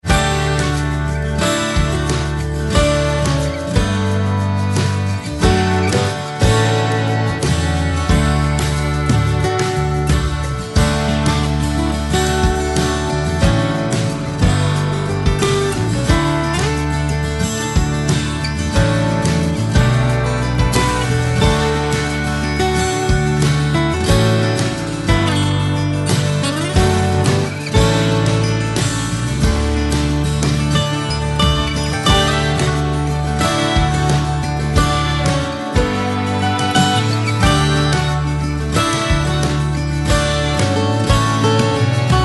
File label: country track